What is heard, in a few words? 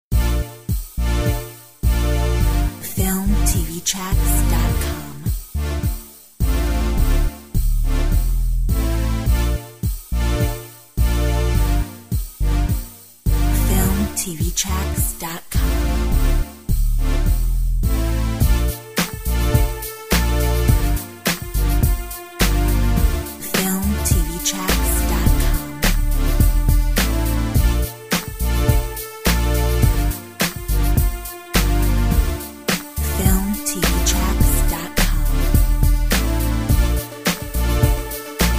Candy pop